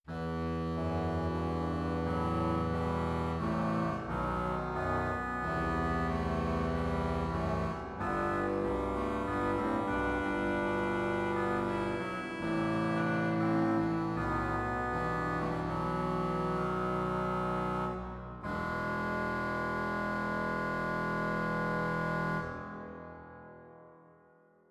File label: Rugwerk Fagot; zwolle